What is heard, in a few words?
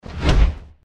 deathclaw swing; npc